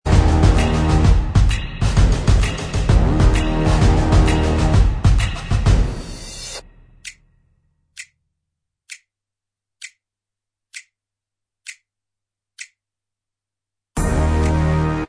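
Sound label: instrumental, backing track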